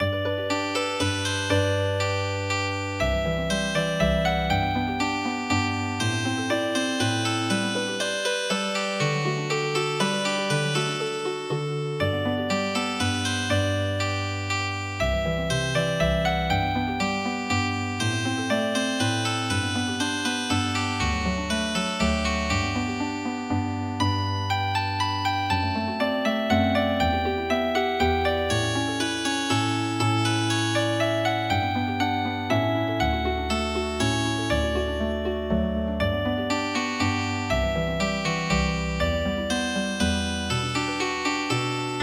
minuet Healing Harp

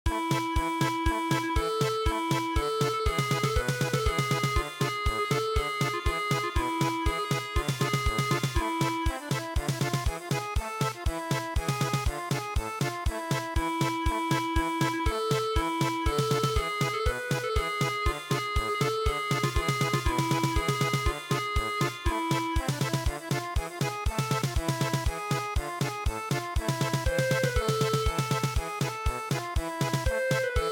German Polka

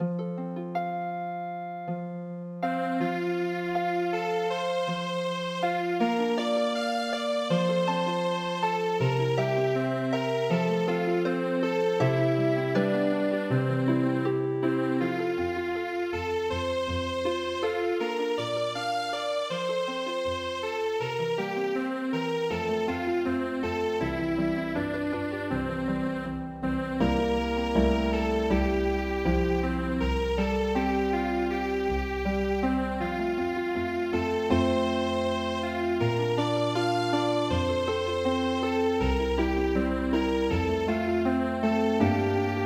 Frohlicher Landmann Healing Harp

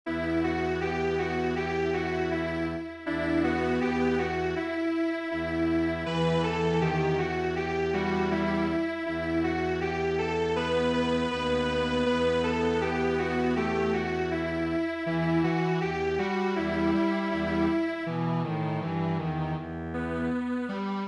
canon Strings Quartet; traditional

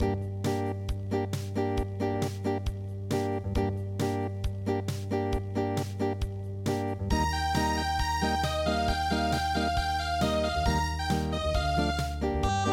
Unplugged

